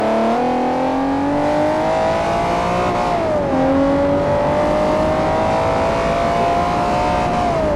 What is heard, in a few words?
second, vehicles; TDMCarpack; TDMCars